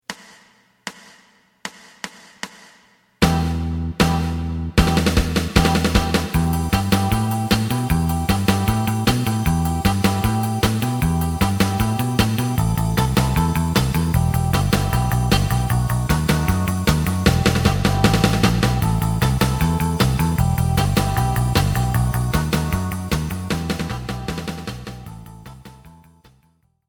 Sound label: TWO GUITARS lead; エレキバンド用カラオケ